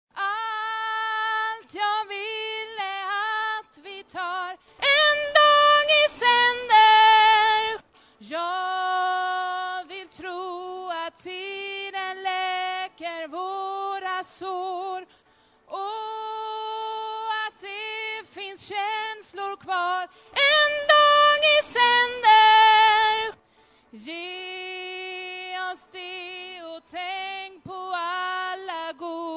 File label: tenor